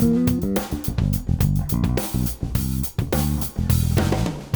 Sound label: Full Mix